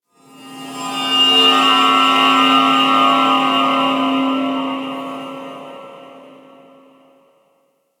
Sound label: High Shimmer; Warframe; Ambience; PVP; CTF Orokin